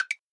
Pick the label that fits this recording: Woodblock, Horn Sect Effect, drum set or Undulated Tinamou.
Woodblock